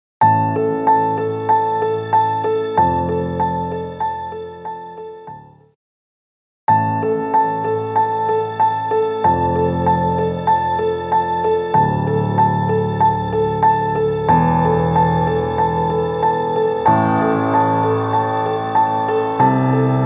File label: Lexicon Model200 Rich Plate Orchestral